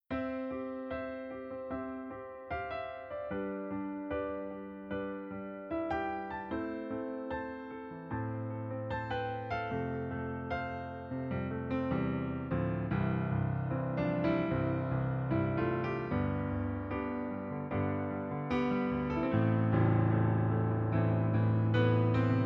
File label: CFX Rock